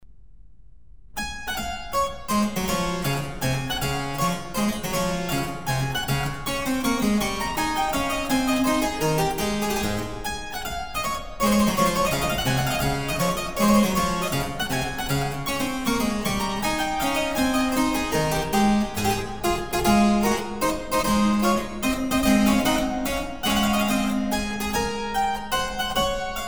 Minuet in D; Piano variations, Rondos; Mozart; Haebler, Uchida, Koopman

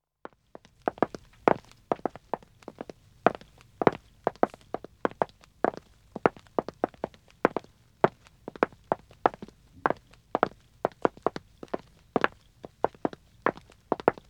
Wood (Dead Acc) Walking 1 man, Footsteps; The BBC Sound Effects Library

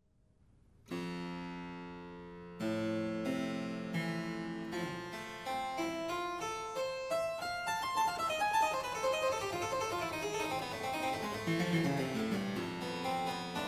Modulating prelude in F major-E minor; Mozart; KV deest; Rarities and surprises; Davis, Marriner, Schreier, Sillito

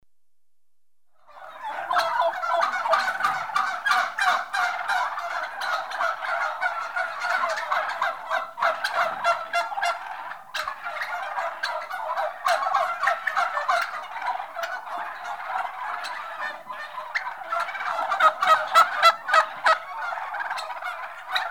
General atmosphere of Turkey Hens; Livestock; BBC Sound Effects Library

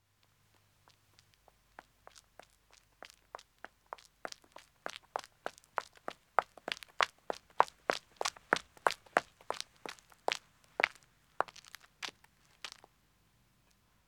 Country Road Running 1 woman, Footsteps; The BBC Sound Effects Library

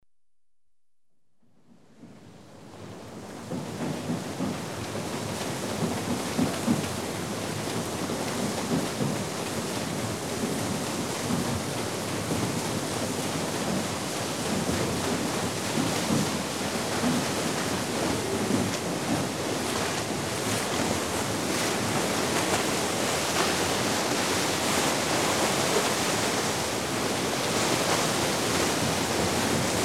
Arriving at dock and berthing, Ships & Boats; The BBC Sound Effects Library

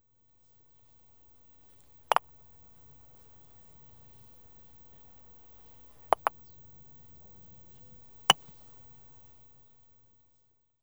Croquet - ball hit 3 times. No. 3 a 'croquet'; The BBC Sound Effects Library; Sport and Leisure

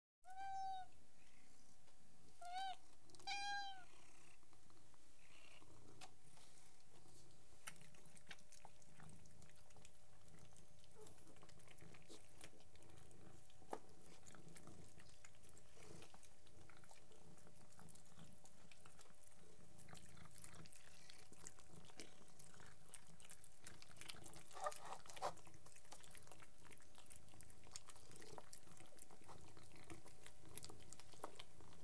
3 cats eating and purring; Animals & Birds; The BBC Sound Effects Library

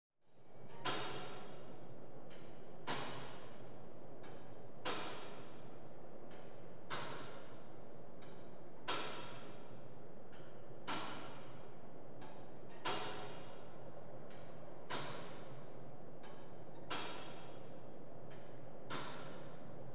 Big Ben - ticking; Cities; The BBC Sound Effects Library

